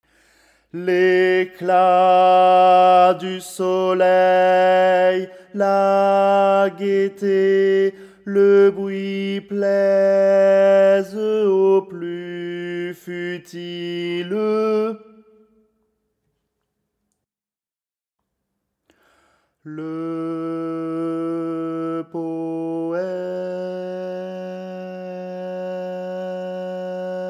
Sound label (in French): calme des nuits alti; Chants